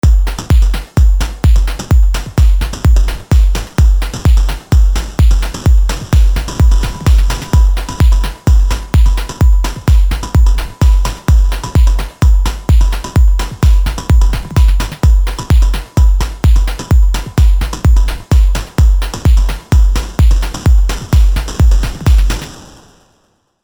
Avenger 2 Hihat Pusher; Vengeance Sound; Melodic Tech Voices 1

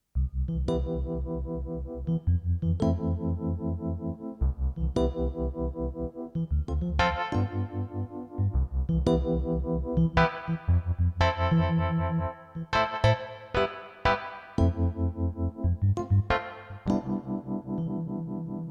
EPiano2

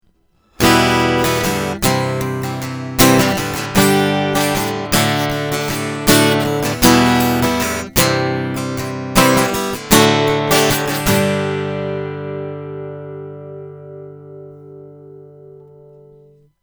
Harley Benton CLD-15CE Flame Koa High Boost; Exotic

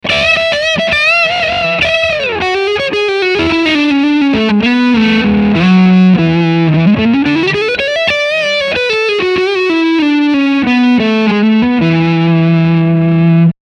Neck HB Overdrive Lick

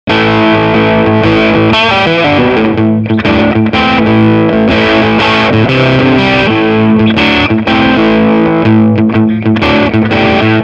Egnater

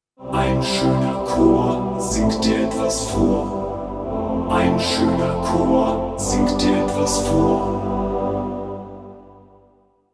VP550 Pop + Mixed

